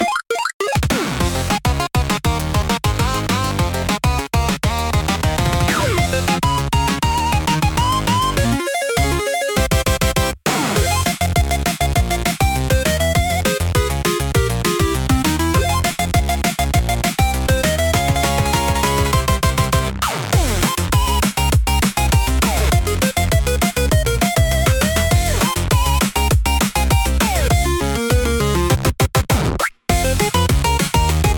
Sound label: retro game chip tune; pico pico sound